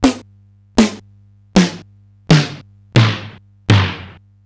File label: Simmons SDS-9 Snares